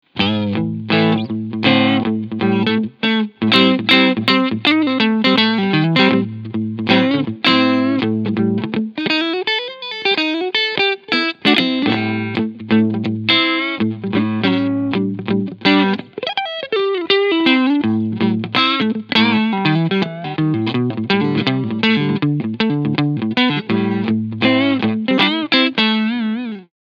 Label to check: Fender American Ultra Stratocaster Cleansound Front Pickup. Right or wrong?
Right